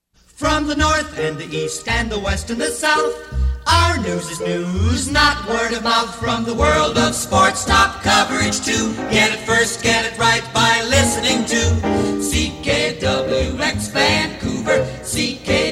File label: jingles; 980 CKWX Vancouver; 1950s